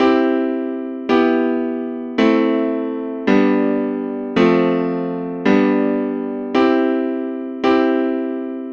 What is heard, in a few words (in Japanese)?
Ⅰ→Ⅶdim→Ⅵm→Ⅴ→Ⅳ→Ⅴ→Ⅰ進行